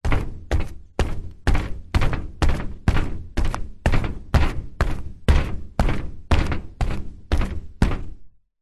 footsteps flip flops walking metal external platform floor; foley; zapsplat